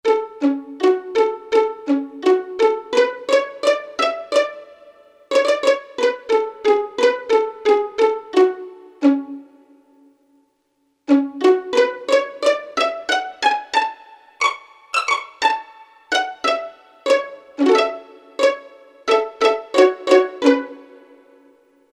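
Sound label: strings pizzicato violin; E-mu Proteus FX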